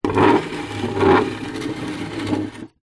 household chair small wooden drag scrape squeak hard ground